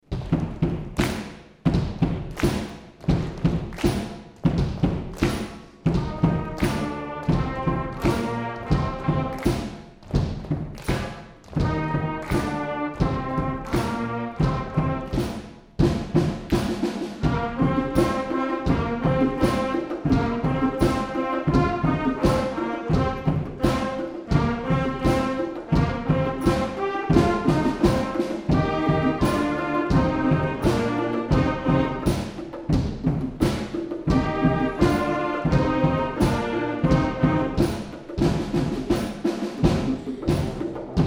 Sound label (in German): Schülerblasorchester